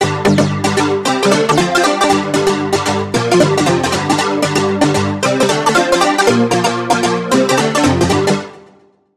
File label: Key; Kung Fu; Routed Sound; Spire Expansion - Hyper Gamer Vol.1